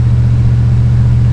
GTO rpm, Engine; Cars

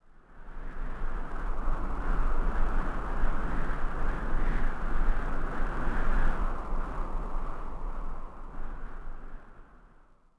wind gust; Star Wars Obi Wan; XBOX

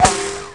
Ricochet, Impacts

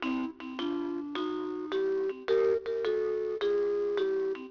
mx lounge; Tony Hawk's Project 8; XBOX; houses

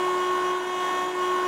engine LOOP; guido; Cars; XBOX

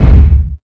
stompy step; animal; Tony Hawk's Project 8; XBOX

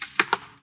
ball hit branches; golf; ProStroke Golf World Tour 2007

